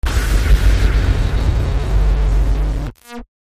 Nuclear Explosion; SFX

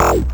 Synthie, Bass 808

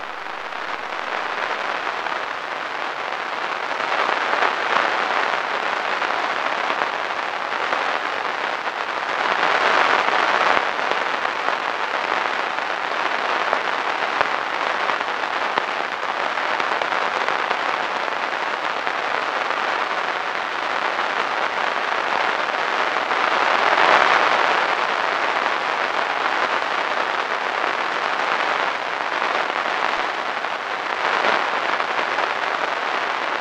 Radio Noise; SFX

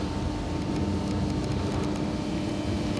Electric Buzz; SFX; IRL Recorded

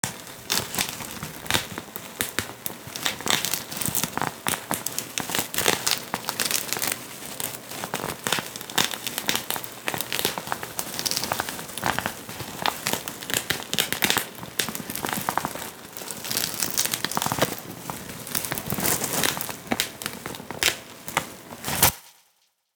Bubble Wrap; SFX; IRL Recorded

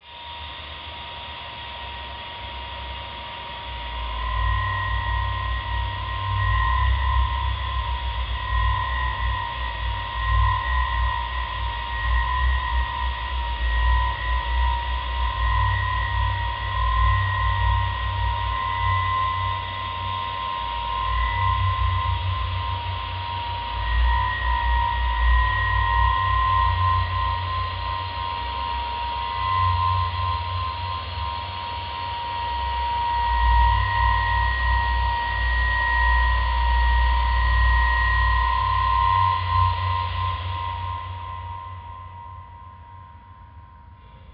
Rusted Ship, Ambient; SFX; Ambient - General

